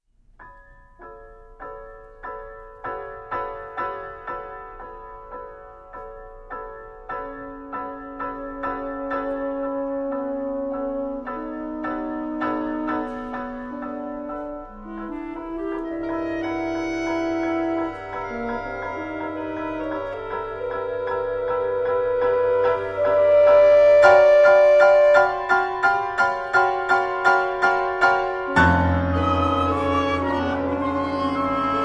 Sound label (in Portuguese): sexteto, para Piano e Quinteto de Sopros; Sexteto para Piano e Quinteto de Sopros (1997); Harry Crowl